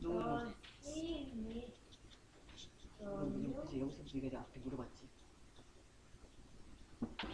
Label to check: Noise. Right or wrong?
Wrong